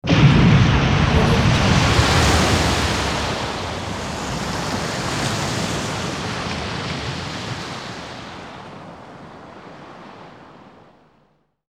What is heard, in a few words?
monster rises from ocean